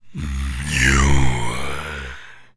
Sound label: zombie carrier taunt